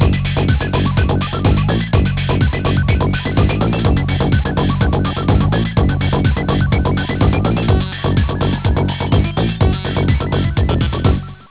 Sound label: chiptech